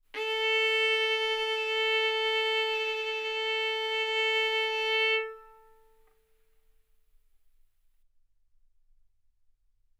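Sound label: Violin arco mf A string A4 B4 stereo; sul A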